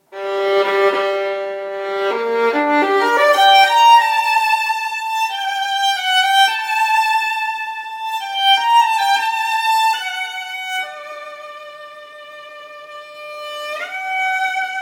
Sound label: Violin Solo